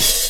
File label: HH, HIHAT; 90 s Sample CDs; Best Service Dance Mega Drums